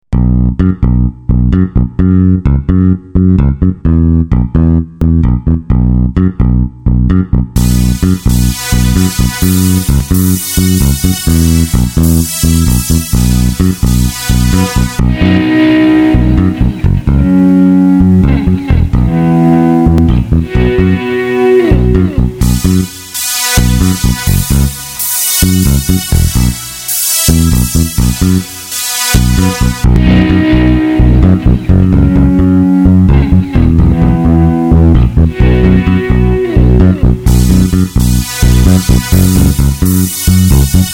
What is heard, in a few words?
Duelin Bass Funky